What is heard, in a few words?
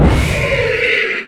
reality rift cast; weapons; dota; chaos knight